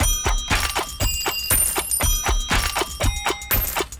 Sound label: gamble prop; Amatic; Hot Neon